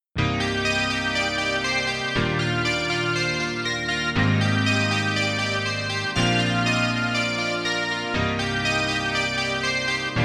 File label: Synth Lead; VIVO S10